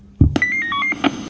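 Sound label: push-to-talk, radio effect; Ptt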